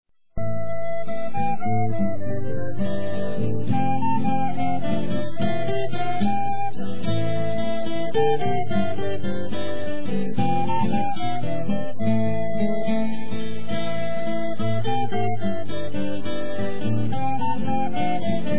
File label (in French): valse tordue